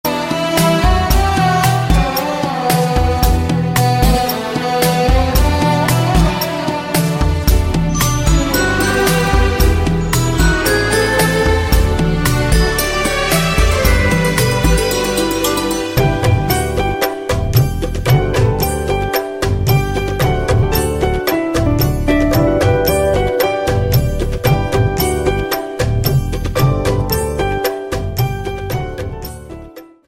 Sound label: Instrumental